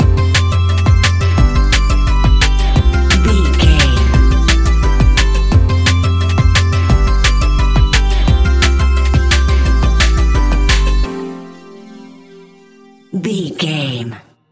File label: cybernetic dawn, A cool piece of electronic music perfect for the nightclub